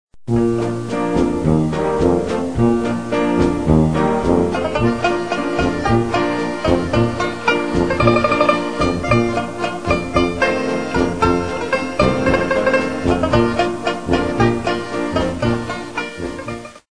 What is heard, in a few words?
Tuba